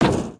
metal, human step

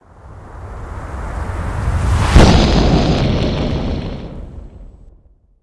attack hit flame, poltergeist

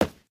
concrete, human step

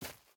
step grass

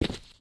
step default

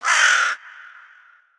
crow, outdoor ambient

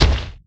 large step

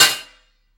target hit

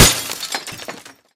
glass, bullet collide

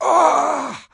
wound, stalker

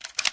spas12 reload, weapons

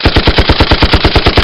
weapon heli

